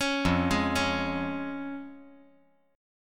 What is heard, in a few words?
F Minor Sharp 5th, down